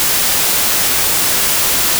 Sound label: White, noise; Logic Pro X; Alchemy; WaveNoise